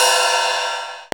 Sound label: CYM CHEEZ, Cheez Cyms